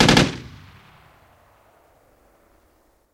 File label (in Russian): pulemetnaya ochered iz dota